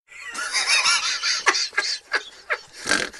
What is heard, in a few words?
laugh; hl2dm